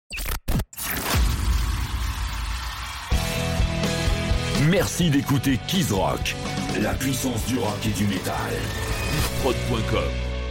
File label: Sweep Rock Metal; Kizrock